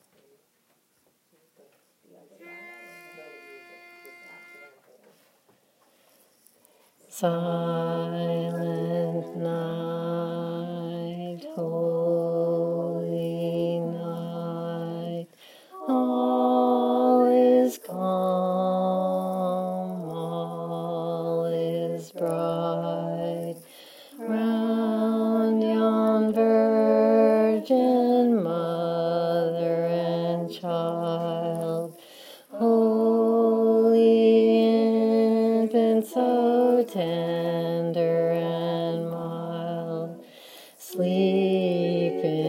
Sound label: Silent Night Bass, chorus